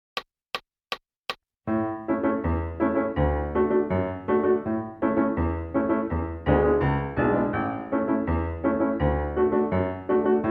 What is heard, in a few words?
Piano; Boil 'em Cabbage Down